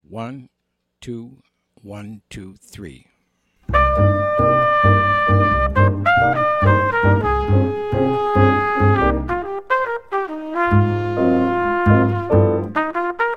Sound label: minus Drums; count off sets up the melody